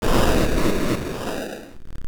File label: Fire; rpg